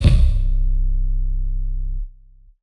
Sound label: Hit 808